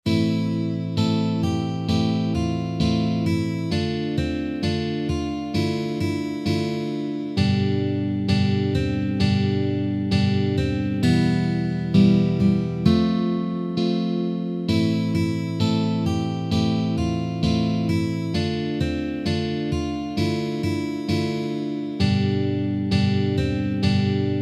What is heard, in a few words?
Verse Slow Tempo